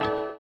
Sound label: orient, bizarre guitar; Spectrasonics